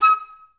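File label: flute staccato D#4; Best Service; Peter Siedlaczek's Advanced Orchestra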